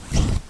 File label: cloth